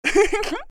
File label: Giggle